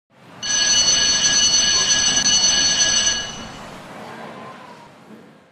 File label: bell crowd